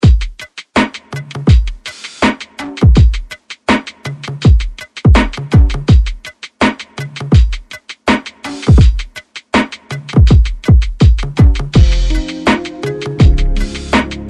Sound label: Drum Shot Lo Fi; Kryptic Samples; lofi bundle